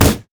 blue mech melee basic attack; minions; LoL

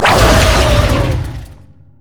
grasping roots; Zyra

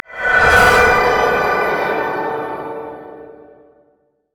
summoner mana; LoL

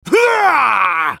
ultimate effort; VarusAscended

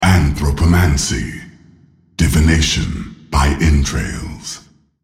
attack; Nasus; Character Voices - English

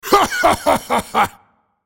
Minotaur laugh

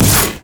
execute; LoL; darius norseking

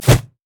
poppy basicattack oc

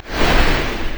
basic attack; Xerath

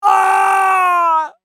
dying; masteryi

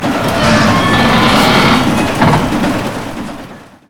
prop claw animated; odin

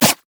two shivs; jester

